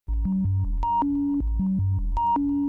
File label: SMS